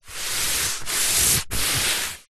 sport exercise mat foam hand rub

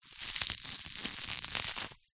silica gel packet small squeeze; zapsplat; foley

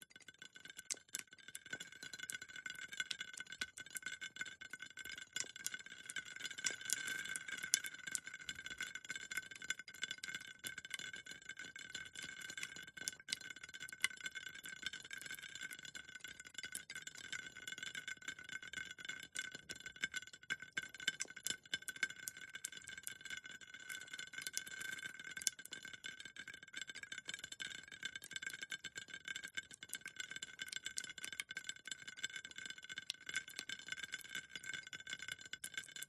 designed drippy on glass; PMSFX

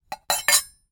household silverware pot lid movement; zapsplat

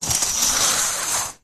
hospital ward cubicle curtain open or close

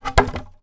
wood plank small drop on soft ground; foley; zapsplat

